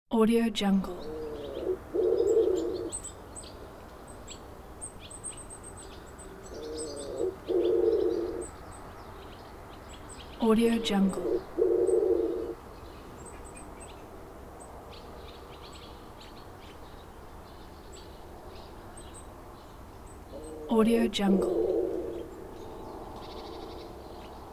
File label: cooing pair of doves in ambience; صدای یک جفت کبوتر; nature sounds